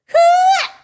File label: eeuh; daisy; SM64 CO-OP